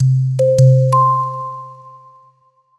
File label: Win Fanfare